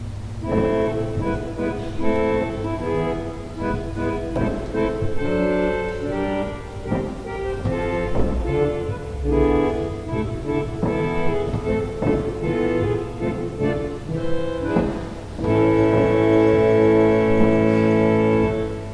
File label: Mannborg